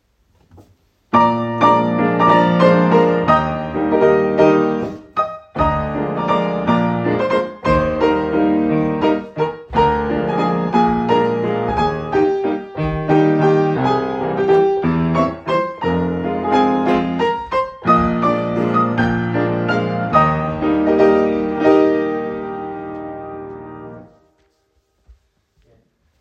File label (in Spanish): Musica Varones Grupo